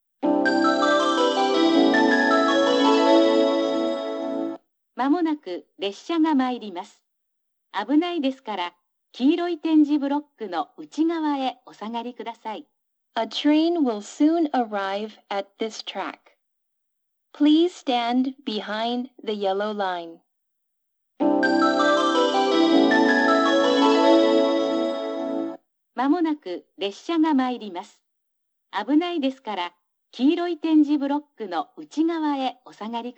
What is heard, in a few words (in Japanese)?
由布院, 下り列車接近メロディ＋放送（女声）